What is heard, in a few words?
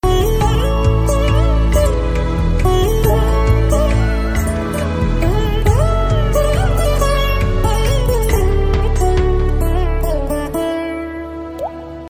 instrumental ringtone; tamil ringtones